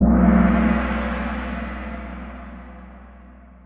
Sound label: Gong; Kahoot